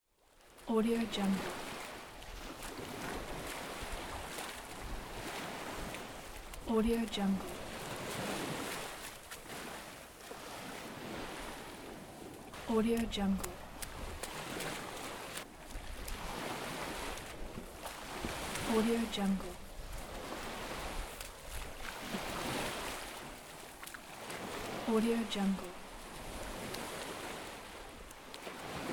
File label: footsteps at the edge of the sea